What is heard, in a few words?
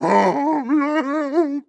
idle moan dry, zombie vox; creatures